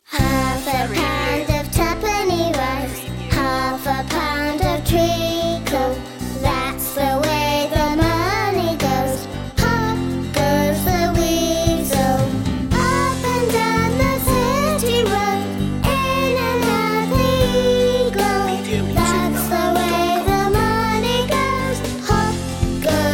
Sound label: pop goes the weasel children vocals